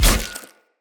Sphere Polearm Medium Impact Flesh

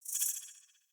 Acorn Timing Pulse In; Quick Time Events

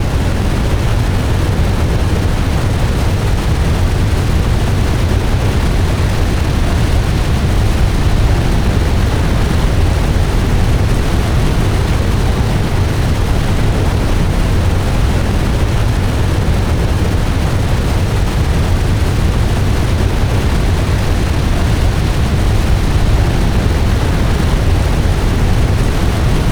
Dungeon Island Water Fall Landing Loop; Ambience; Props; Warframe; Duviri

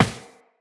Step Glass, Footsteps, Walk; Tenno

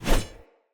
Spear Whoosh; Weapons; Cleansed Spear

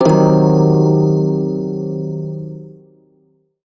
blues chord, shawzin; Warframe

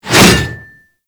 Axe Heavy Swing; Warframe

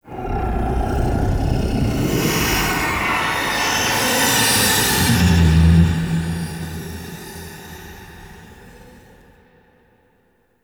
Whispers Large; MITW; Warframe; Ambience; Entrati

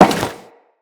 Crewman Step Default, Footsteps; Corpus

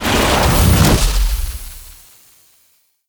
Spawn; Kuva Lich; Abilities

